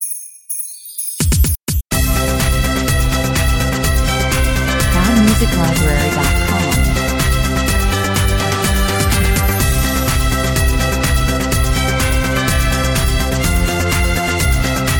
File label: dance remix 125 bpm